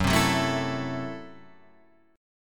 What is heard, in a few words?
Gb Minor 7th Flat 5th, Guitar